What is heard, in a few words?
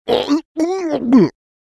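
vomited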